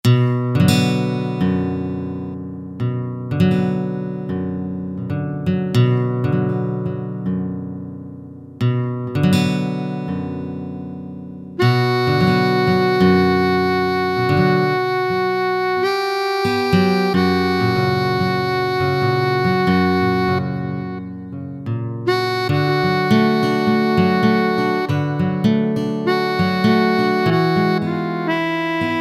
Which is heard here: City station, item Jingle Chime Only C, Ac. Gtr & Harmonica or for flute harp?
Ac. Gtr & Harmonica